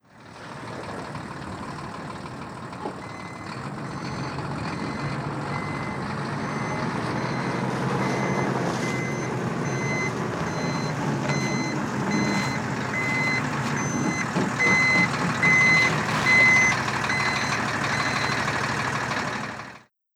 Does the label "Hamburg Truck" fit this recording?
Yes